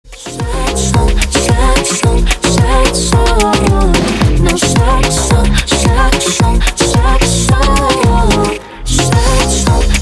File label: Sad Song